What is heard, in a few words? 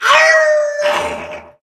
hyena howl sin growl